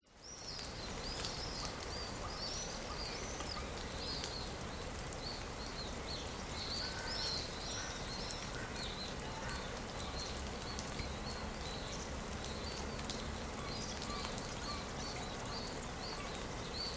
forest ambience wet misty damp drips birds crows swallows spring cyprus